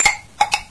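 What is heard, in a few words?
shotgun shell, weapons; video game; source engine; hl1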